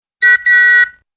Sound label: mailman's whistle